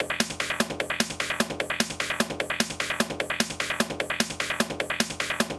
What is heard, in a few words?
Booster Beat 150